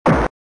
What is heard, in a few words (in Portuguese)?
defesa; Ben 10 - Alien Rivals; ThrillGames